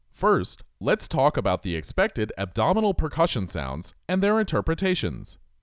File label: Percussion; Ab Percussion